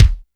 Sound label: BD, SR16 drums; 90 s Sample CDs; Best Service